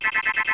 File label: enemy takes baby; smw2